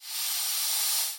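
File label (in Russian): сердитое предупреждающее шипение большой змеи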